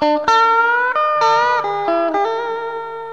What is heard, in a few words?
lick